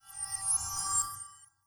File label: Poison Dart; Legend of Keepers (gamerip) (2019)